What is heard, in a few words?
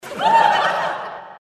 laugh short